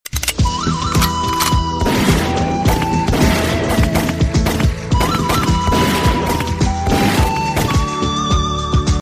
shot; Good bad ugly

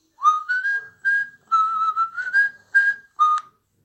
Whistle alarm

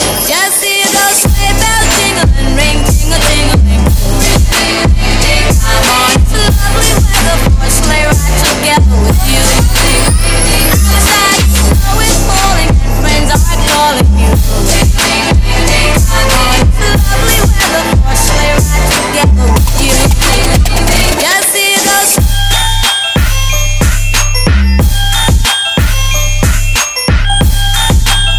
Sleigh bells remix

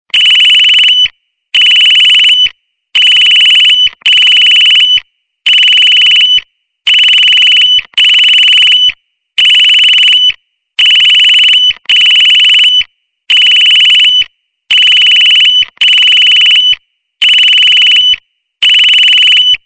Highpitched Tone

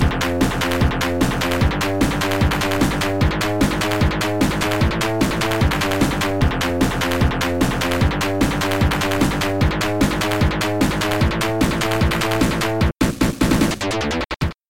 Drum; Fly Batt Act 2

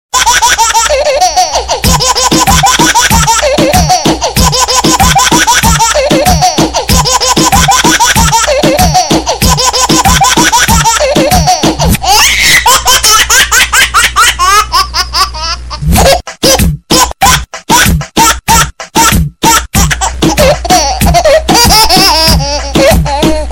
Baby Laugh Mix

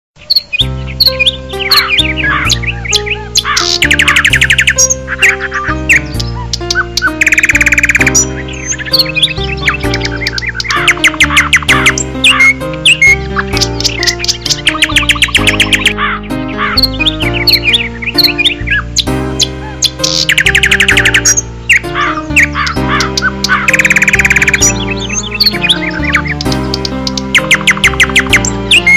Nice Morning Melody

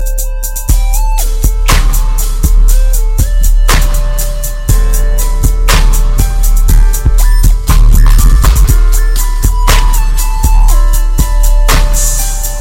G Funk

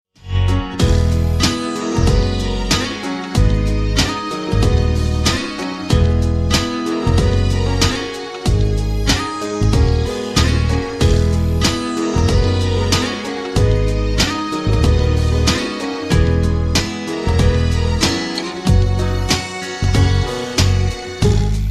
Instrum

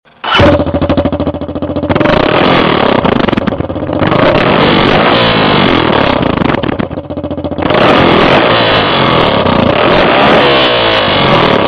Yamaha Raptor 700

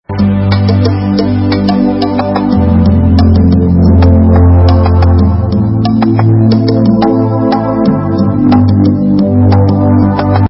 Wave Movement

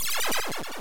Arm Explodes

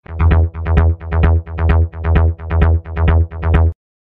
Bass Dancing Bass

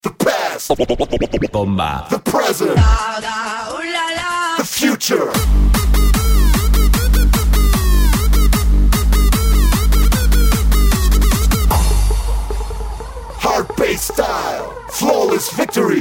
Hardstyle

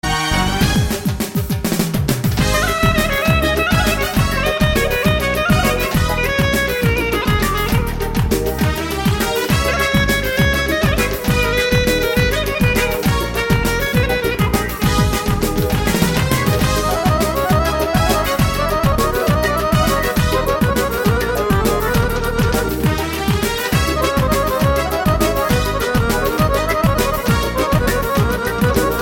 Kavkaz Clarinet

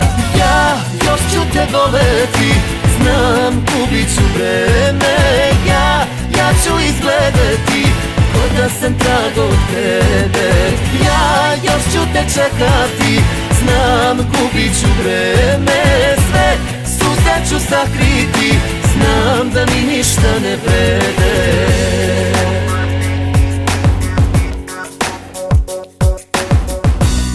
Funky

